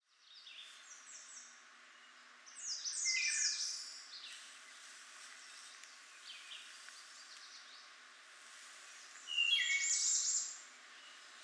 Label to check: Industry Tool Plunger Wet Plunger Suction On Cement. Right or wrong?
Wrong